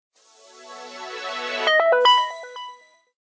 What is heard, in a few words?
Power On; LG; GB255g